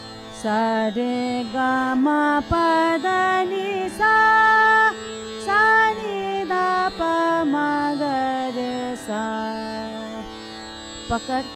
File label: Kafi Aroh Avroh